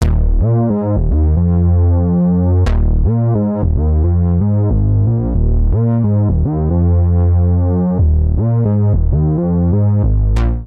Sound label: Reso Bass F Minor 90 bpm; MIDI Clips; Tonal; Bassline; Grey Panel; Ableton; Factory Packs; Chop and Swing